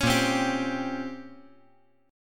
A sharp Minor 6th Add 9th A sharp down, chord; strummed

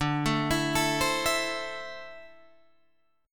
D 7th Suspended 2nd, Guitar, Drop D

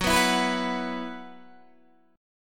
F sharp 7th Suspended 4th, Guitar, DADGAD

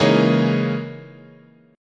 C sharp Minor 6th Add 9th, chord

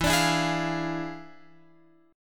E 7th Flat 9th Guitar Open F, chord; E7b9; strummed

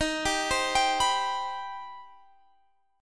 C Minor Major 7th D sharp

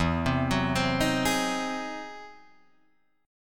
E Augmented Major 9th, Guitar, Open D

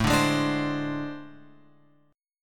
A Minor Major 7th Double Flat 5th; AmM7bb5 Chord {5 5 6 7 X 8} strummed

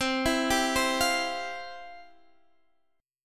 C Major Add 11th, guitar chord

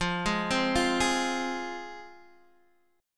F Minor, Guitar, Open D

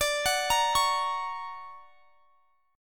D Augmented Major 7th; arpeggiated; x,x,24,23,23,21; chord

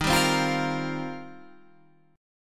D sharp 6th Add 9th D sharp; D#6add9; strummed; 11,10,10,10,11,13; chord shape

